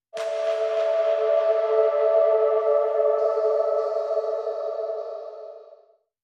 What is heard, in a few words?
Percussive Sting